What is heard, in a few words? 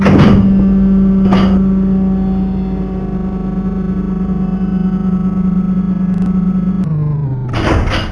crane; rogue